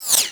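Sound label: bullet left to right, near miss; hl2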